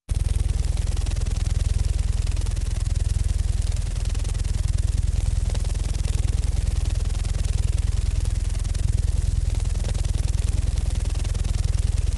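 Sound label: mutant insects unknown buzz sweetener idle; Sonniss GDC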